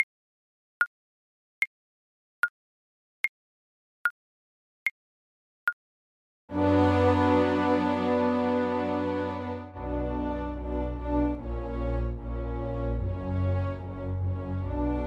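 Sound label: VLC karaoke, Cello; beethoven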